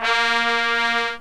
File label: POP BRASS